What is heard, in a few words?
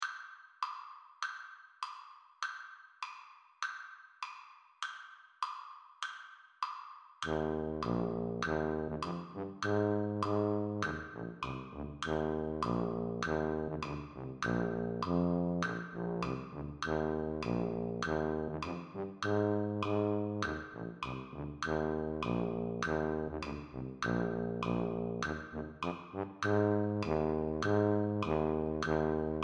Tuba, Slower Tempo; Tuba Only - Slower Tempo